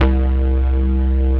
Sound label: bass, 135 BPM, drum & bass; Ueberschall; Drum & Bass Flash Trackz